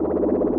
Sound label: arcane loop; MagicArsenal